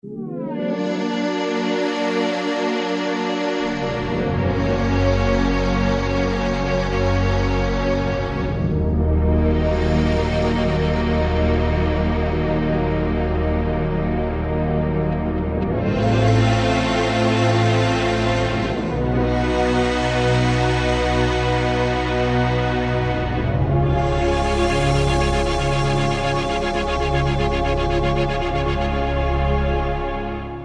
Elastik Pad, Korg N364; Factory Prog demos

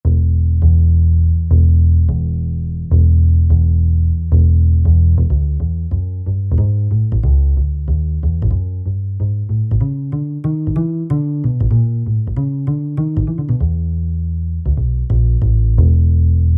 Upright, synth

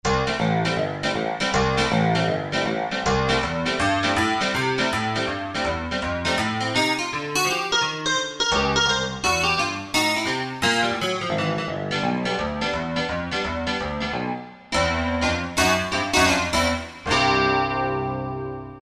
Pick Piano, Korg T3

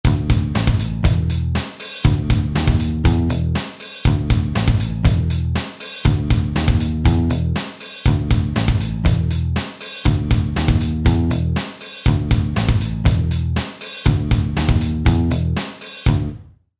Rock

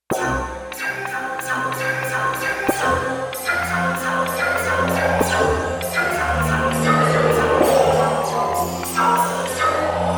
Bamboo Stack

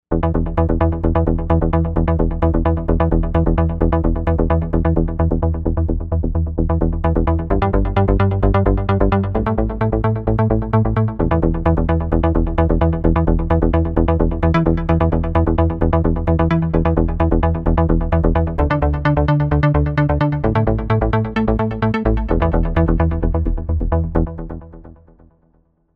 FM Strike, Novation UltraNova; Factory Patches

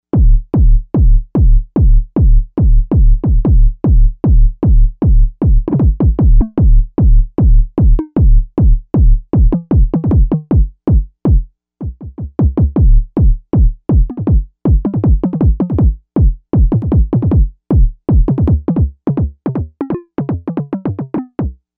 Kick Kick Kick, Novation UltraNova; Factory Patches

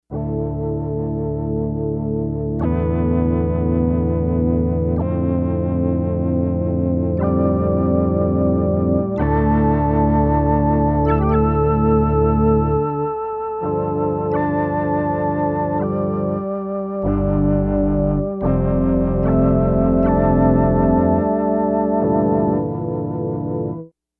Empty Rotor

